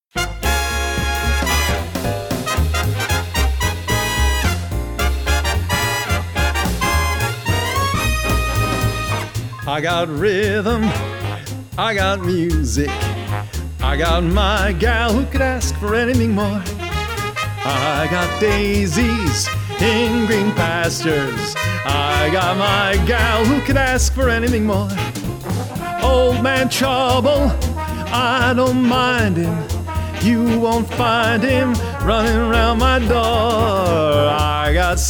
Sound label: Vocal